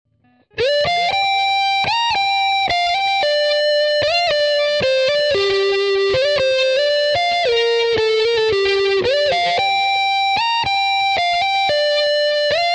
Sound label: Guitar